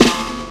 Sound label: Snare, Percussion Hits, Snares